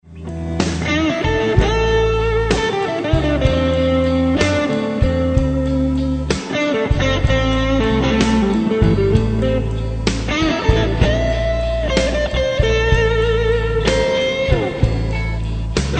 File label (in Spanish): solo blues lento